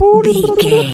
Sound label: voice fun character flutter man